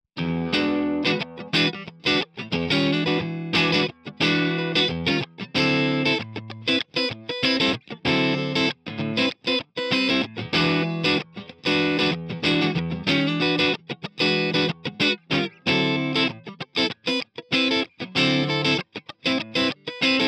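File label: Amp Only Clean, guitar; Archetype Tom Morello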